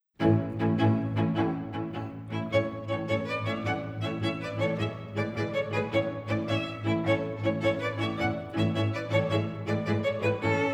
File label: Galops, exam music